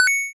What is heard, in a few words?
Retro Keypickup